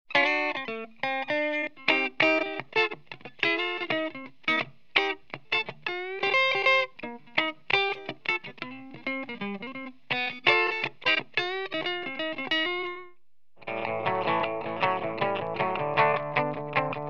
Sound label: clean Tweed DeLuxe; Model 11A